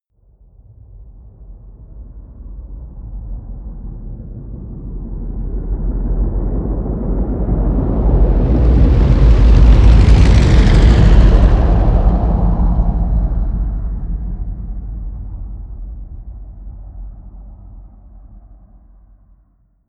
flyby

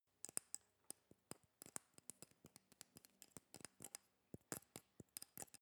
scissors handle quiet

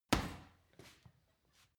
punching bag kick medium

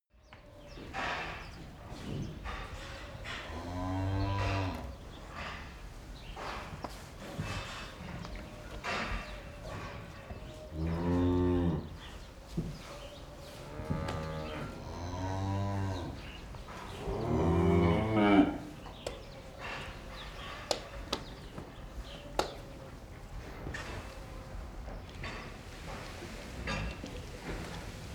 cow barn

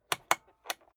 rotary control short turn

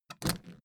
suitcase lift up

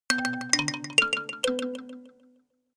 notification kalimba delay sequence ascend

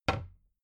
cabinet door close soft

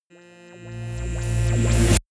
rise buzz low

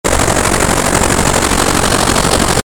rough distortion

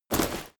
step leather light

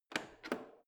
plastic button creak press

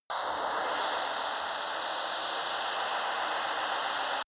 space truck

